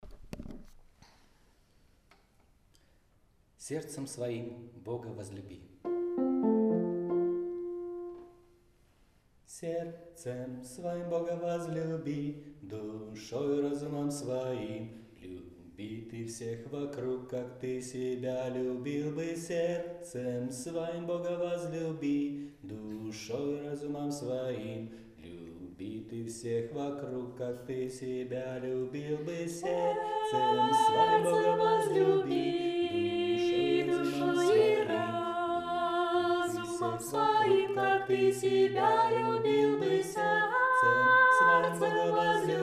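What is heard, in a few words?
bass; Serdcem svoim Boga vozljubi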